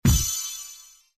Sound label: xp win; Skillzgaming; mega Money Rush Desktop